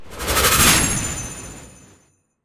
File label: Wind Blade; Legend of Keepers; Wind Blade All